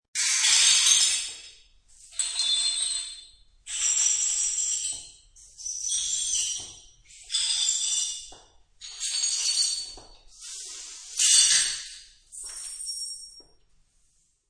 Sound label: Sweeping up pieces of shattered glass and plateware.; Kitchen; Household